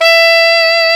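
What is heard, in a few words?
saxophone alto mezzo forte; Roland - LA Composer vol. 1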